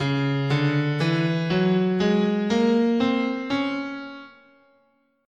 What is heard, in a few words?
Db Neapolitan Major, scale